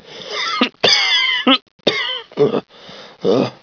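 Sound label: cough; magnus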